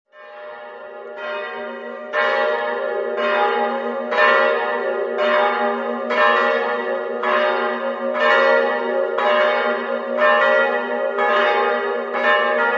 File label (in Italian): campane; trieste